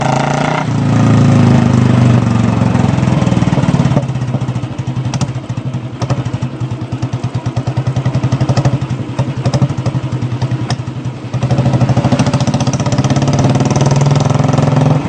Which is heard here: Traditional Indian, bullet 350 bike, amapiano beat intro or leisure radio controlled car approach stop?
bullet 350 bike